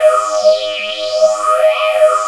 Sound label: 3100 E 3, Korg; Back In Time Records; Korg Universe VOL-1